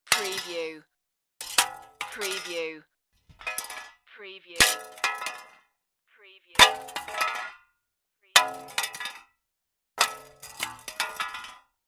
Metal Junk Drop Small - Several Takes

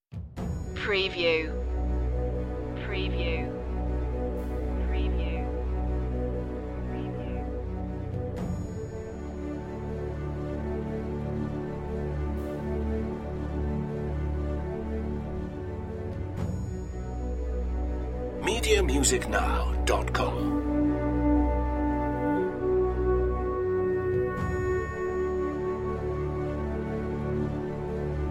my mind is yonder no harp

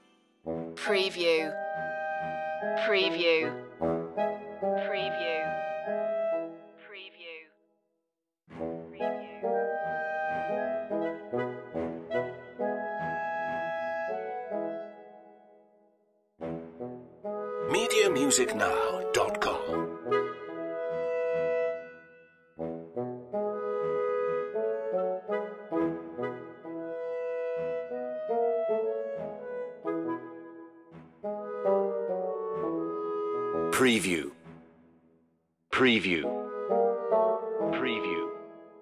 orchestral comedy cue

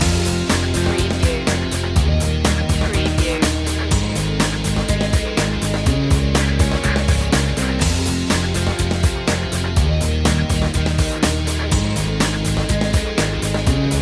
loop, chill

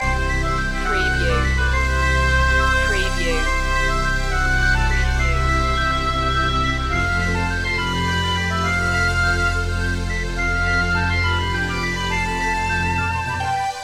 the squirrel loop

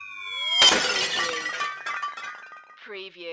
CARTOON BOMBS AWAY BIG CRASH

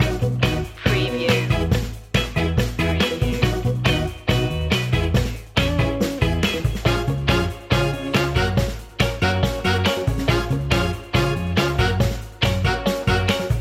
old school detroit loop

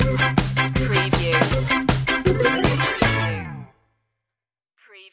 happy ska town stinger

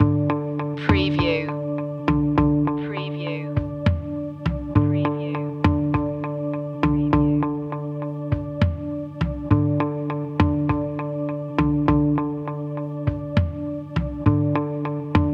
will it rain loop

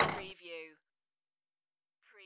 Pinball sound, ball hit with vibrations

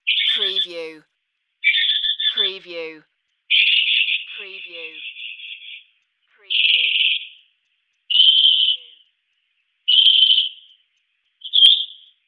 Bird Noises

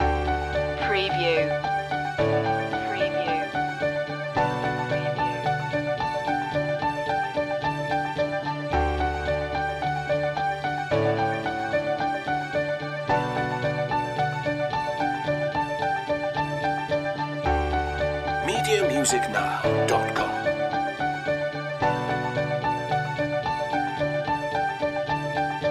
pop celebration loop

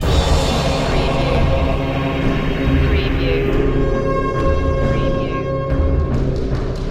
heroic loop

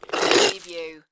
Snow and Ice, Ice or snow scrape with debris

